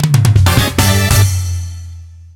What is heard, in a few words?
Race Start Fanfare (Time Trial); Mario Kart 7